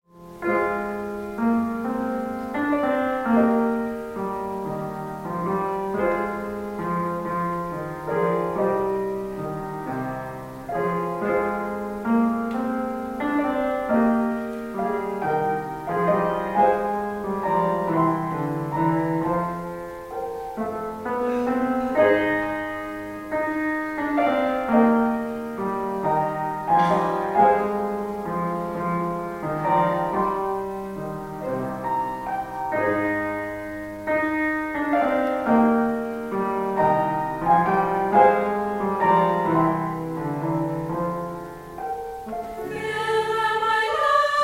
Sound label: Piano; Tilehouse Street Church; Concert items; Dedication 1984